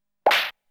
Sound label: Whip; Namco Game Sound Express Vol.1; Valkyrie no Densetsu